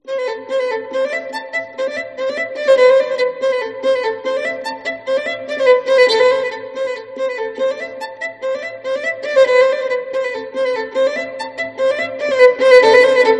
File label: violin